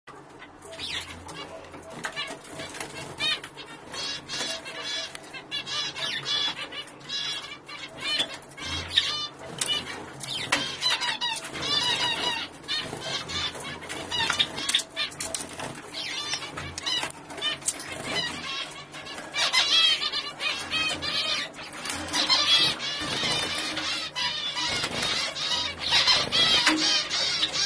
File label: croatian finch sound